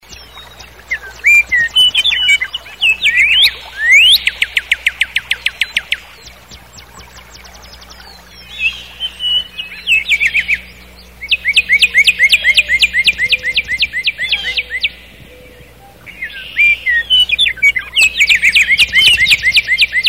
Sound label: nightingale sound